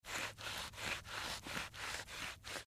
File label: MedicalScientific; Soundfx III